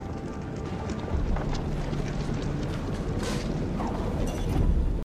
rotating gears; ori and the blind forest

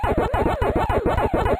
chomp; Pac-Man

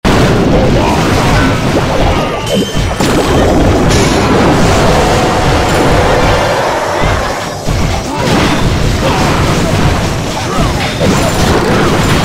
battle sound; clash of clans

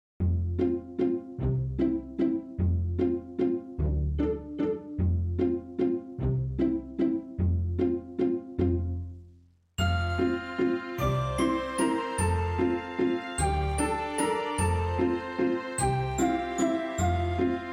festival musik; Stardew Valley

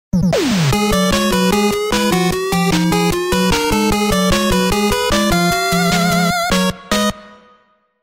mmsoundtrack; Mega Man 2; gamesoundopedia

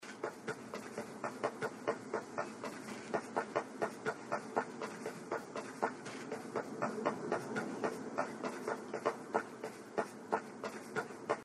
walking; Titan Souls

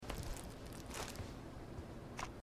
take choco bar sound; Life is Strange 2; gamesoundopedia

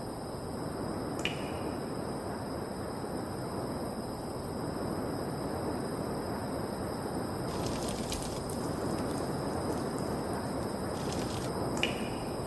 in a cave; return to mysterious island

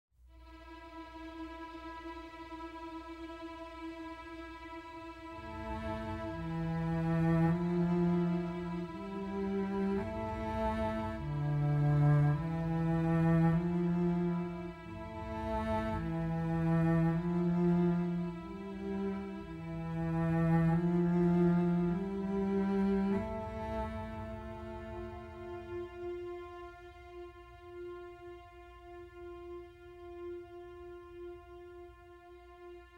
music forest night; baldursgate

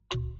menu click; kena bridge of spirits

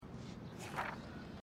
next page; life is strange

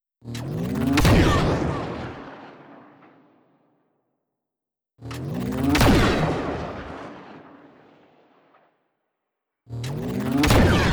sithtrooper altfire; battlefront 2 2017

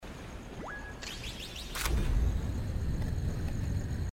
go inside door; hyper light drifter

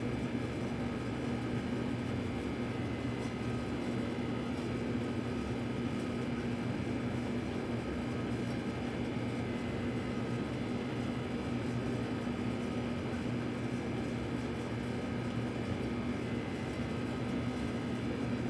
amb loop broom closet

